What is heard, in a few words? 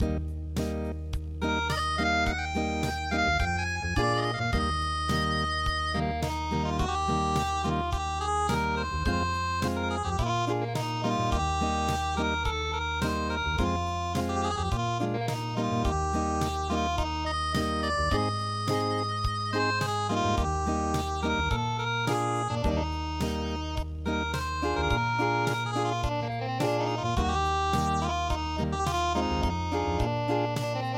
unpluged; La Golondrina